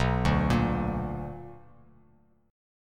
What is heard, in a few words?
B Minor Major 7th, Bass Standard; arpeggiated; JGuitar